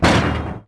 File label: Tank Drop; Civ4